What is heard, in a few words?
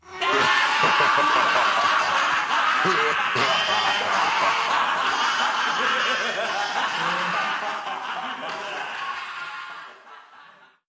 laughter evil; ooh ahh